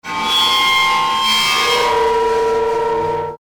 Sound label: destructive metal scrapes